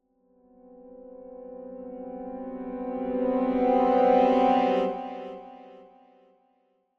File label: Symphonic Strings Suspense Chord - Increasing - Lower; Hollywood Edge; sound designer's toolkit v2